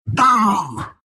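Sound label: pain, Russian; Dota 2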